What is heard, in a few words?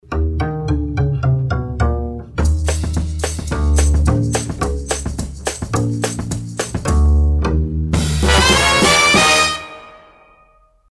Bass Sig; KSEL; PAMS